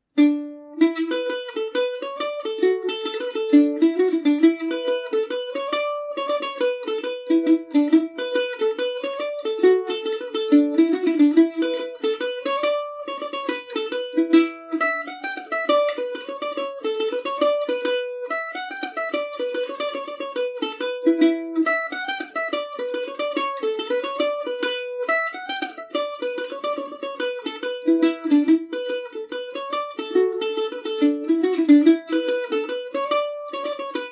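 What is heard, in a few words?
E dorian paris swing, irish mandolin; road to lisdoonvarna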